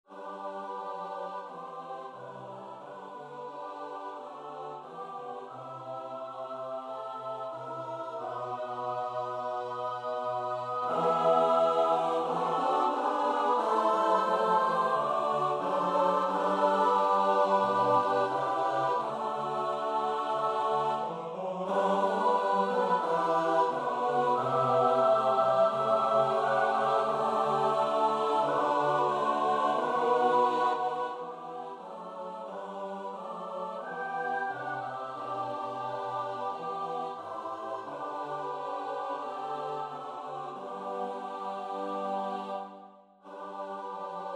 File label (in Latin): midi veni creator spiritus; nowowiejski